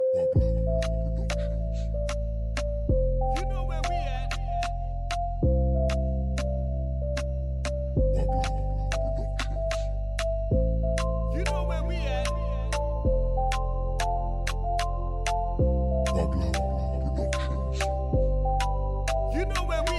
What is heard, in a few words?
Latin Reggaeton